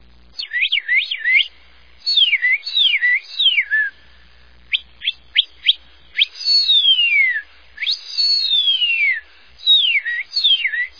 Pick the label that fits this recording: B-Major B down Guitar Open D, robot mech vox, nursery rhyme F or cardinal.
cardinal